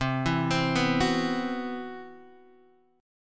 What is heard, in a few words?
C Major Sharp 11th, Guitar DADGAD